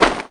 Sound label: arm roll; viridian